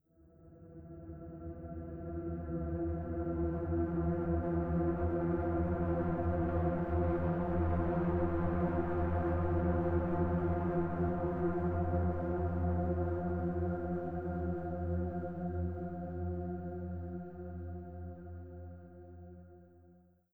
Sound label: deep space